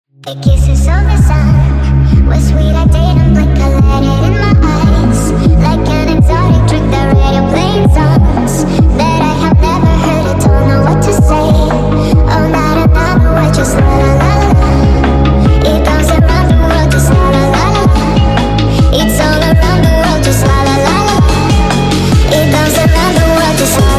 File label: FUNK, SPED UP